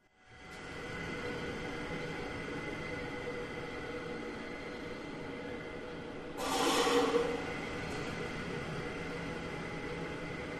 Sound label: Industrial Amb Factory